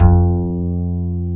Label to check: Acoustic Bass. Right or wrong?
Right